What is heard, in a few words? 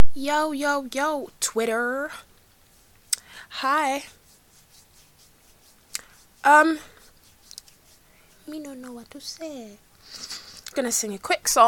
singing